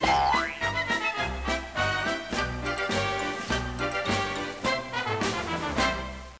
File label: Toy reward; Fisher Price Ready for School Kindergarten